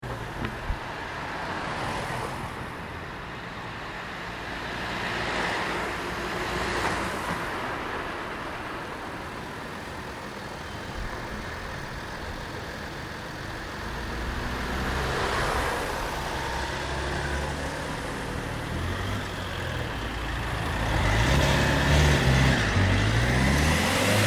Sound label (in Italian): suoni traffico; borgo sacco